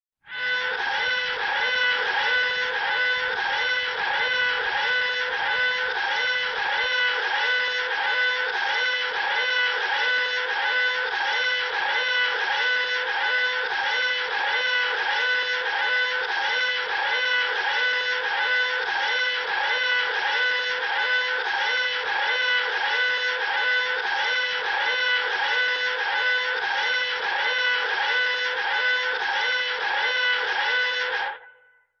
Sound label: Signal, alarm on a submarine